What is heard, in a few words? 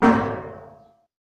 gong